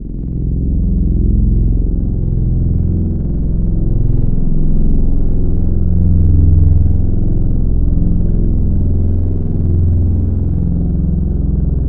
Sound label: First String, Serum Wavetables; Synthetic's Serum Collection; Surge Sounds - ASTRO - Serum Wavetables